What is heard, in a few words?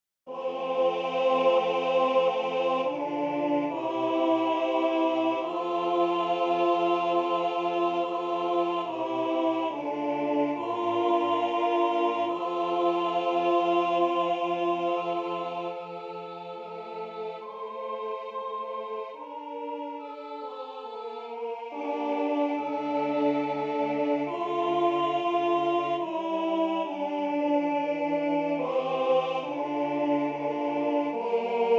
Alto